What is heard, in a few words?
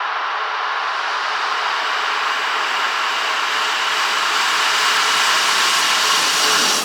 Short Uplifter